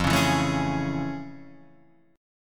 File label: A Major 7th F; AM7/F Chord {1 4 2 2 2 4} strummed